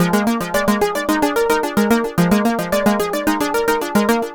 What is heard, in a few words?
Lead